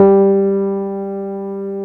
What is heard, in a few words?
DRY ROAD, Keyboards Old School; USB Soundscan; AKAI